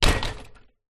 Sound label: vehicles bicycle fall over against wall; zapsplat